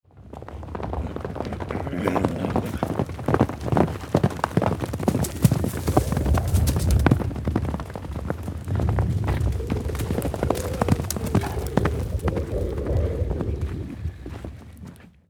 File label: horse sound